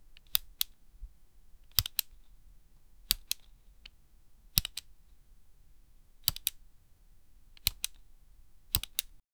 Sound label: button; selection